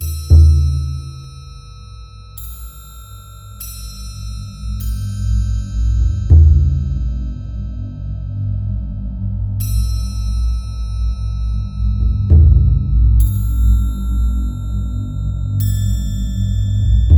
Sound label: Loading